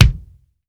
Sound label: JAZY BD, JAZZ DR